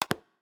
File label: square lock; LG V60 ThinQ